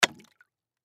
Bottle Water, Bottle Water Twenty Ounce Drop; Drops; Objects; Blastwave; Foley

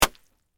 Splat Dish Rag Drop, Wet; Texture; Drops (Sound Effects:Objects); BlastWave

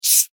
Insect Hiss Angry, Insect, Hiss, Angry, Bug; Insect Hissing; Blastwave; Animals:Insects

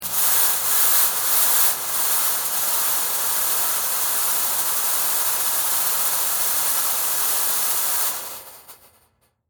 Smoke Machine, Theatrical, Stage; Film Sets; BlastWave

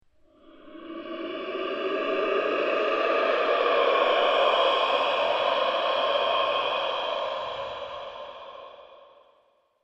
Monster Wind Creature; Monster Sound Effects; horror sound effects

